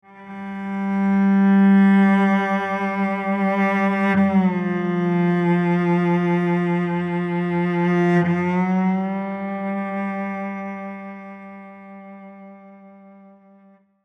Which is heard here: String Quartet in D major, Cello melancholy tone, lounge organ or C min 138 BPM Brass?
Cello melancholy tone